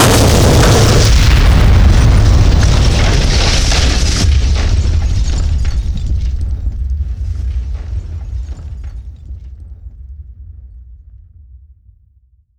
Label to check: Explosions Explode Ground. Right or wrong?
Right